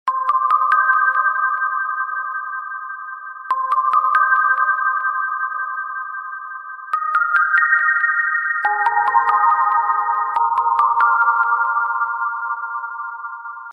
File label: short sms ringtone